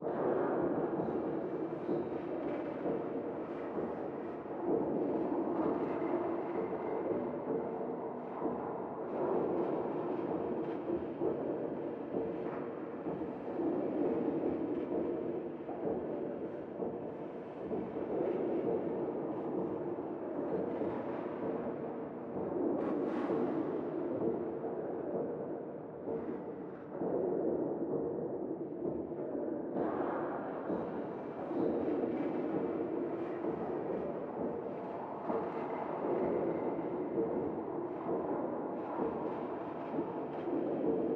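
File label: Ambient Descent Loop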